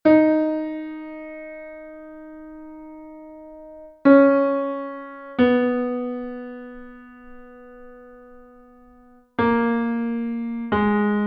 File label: Melody; Canzone del Luna